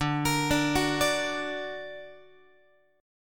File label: D Augmented Guitar, chord; arpeggiated; x,10,13,12,10,13; JGuitar